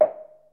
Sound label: res block; AMG - Vince Clarke Lucky Bastard